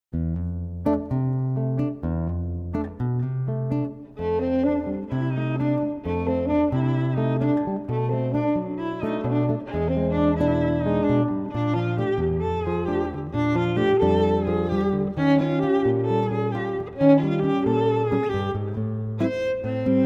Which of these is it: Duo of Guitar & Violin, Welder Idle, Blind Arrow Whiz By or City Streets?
Duo of Guitar & Violin